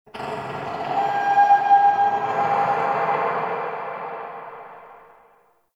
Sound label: Growly Overtone, Ambience; Warframe; Shadowgrapher